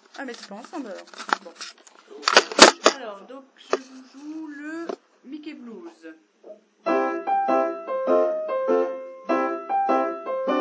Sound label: blues; mickeyblues